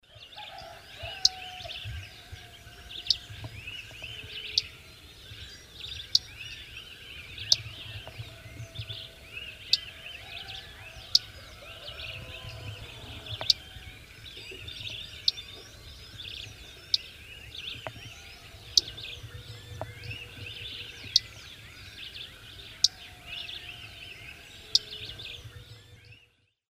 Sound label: Tyrannus savana, calls; S. America; Eastern Lowlands